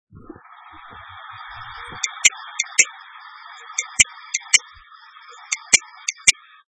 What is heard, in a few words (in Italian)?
Western Digital da 2,5” – testina corrotta, laptop clicking, hard disk; wd